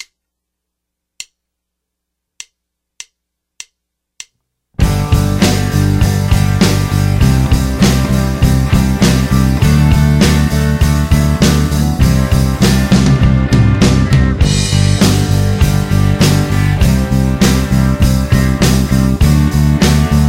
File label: Eighth Notes Song Playthrough 100BPM; Bass Road; Bass Guitar 101